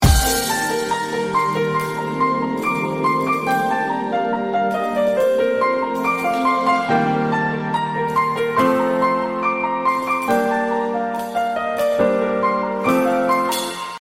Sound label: sad piano cover